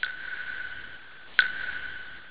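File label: WATER DROPS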